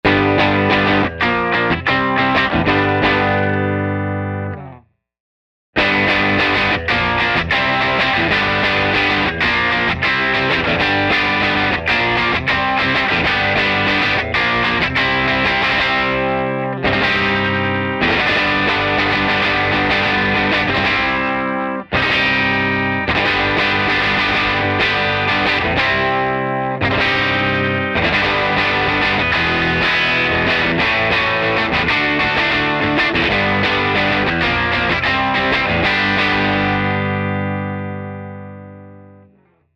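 A softer, but still tight, bright, and pronounced overdrive setting works with a telecaster to create a very convincing 2000’s British Rock sound.; 2000's House Party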